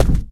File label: plaster, footsteps run; player; source engine; left 4 dead 2; infected